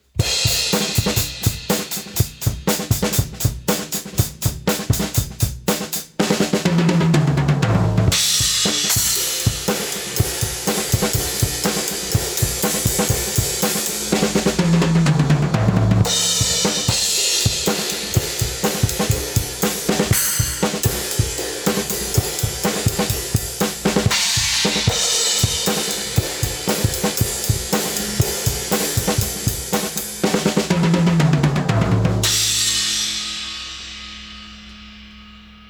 Drum OH; AMEK 9099

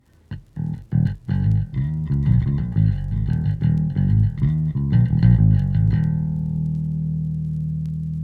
bass Vinyl Phono; Analog Pro

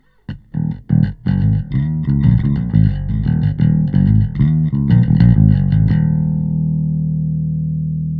bass bypass

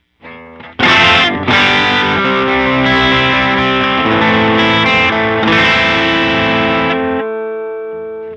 limiter bypass

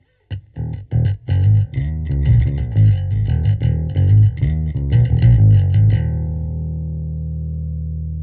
bass; Valves

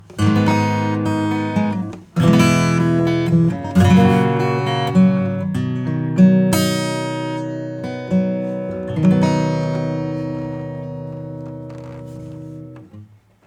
acoustic; ALL AROUND

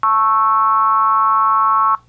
Nord Lead 4 soft

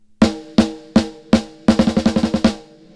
snare; Royal Compressor; MODEL B

